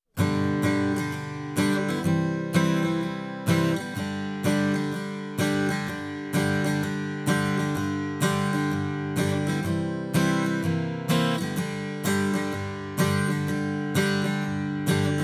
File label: Acoustic Fet II Fairchild; Daking